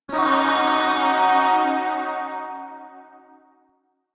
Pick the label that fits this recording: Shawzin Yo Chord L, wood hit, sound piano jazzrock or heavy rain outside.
Shawzin Yo Chord L